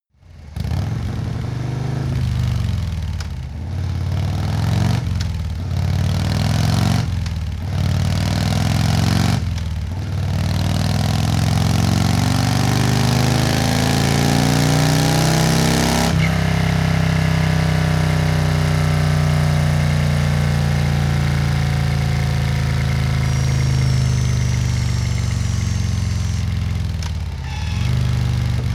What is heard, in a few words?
motorcycle harley engine accelerate gear sequence